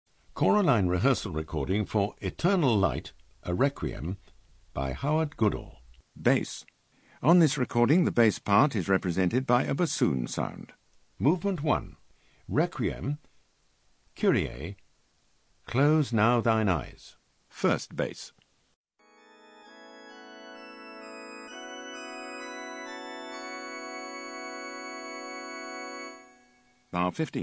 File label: Bass